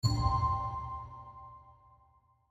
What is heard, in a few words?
eye click; mihoyo hk4e concept animation